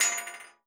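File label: bullet case bounce 50bmg metal; gmod; viper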